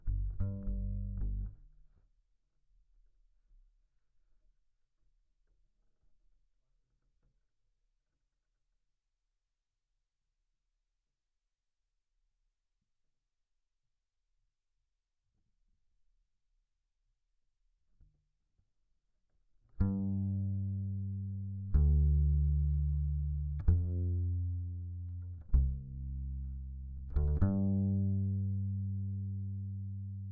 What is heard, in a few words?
Bass DI